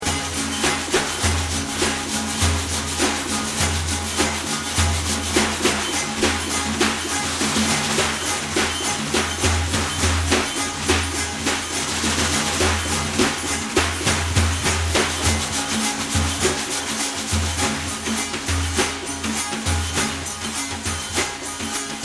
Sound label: daf tanbur; Tanbour